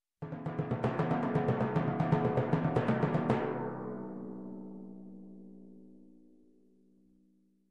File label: Timpani Hits